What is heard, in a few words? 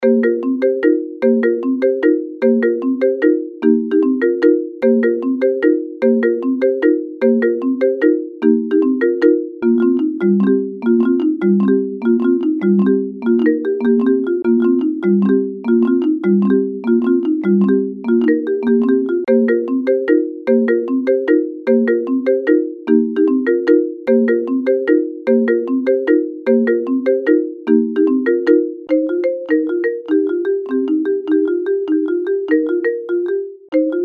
marimba; el tiempo atmosferico